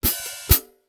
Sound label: Cartoon Drum High Hat Open And Close